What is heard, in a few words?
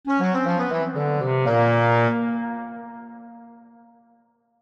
Woodwinds Melody Descend Playful; Woodwinds Melody Playful Descend Low; Blastwave